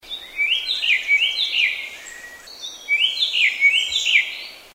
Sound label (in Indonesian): srigunting hitam song